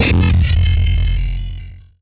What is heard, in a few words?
Flash Digital; Multimedia Internet CD-Rom Flash